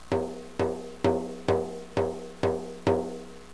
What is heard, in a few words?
hand tightened, banjo